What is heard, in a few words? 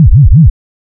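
waveform FM sine sine silence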